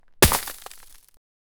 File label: ice storm ice spikes